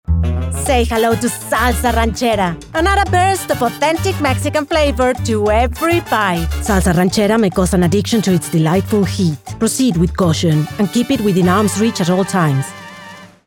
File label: Salsa Ranchera